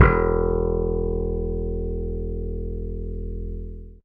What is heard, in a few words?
bass E1, Bass Slap, AKAI S-612 + S-900